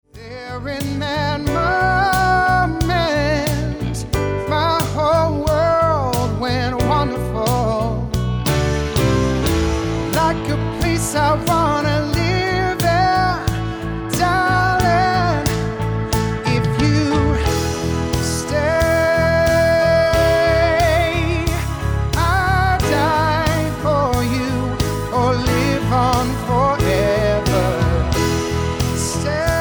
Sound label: Pop Up